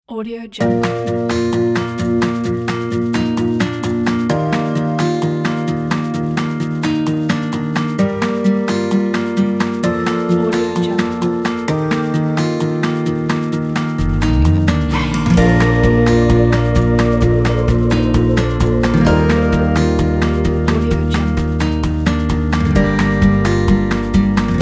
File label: acoustic upbeat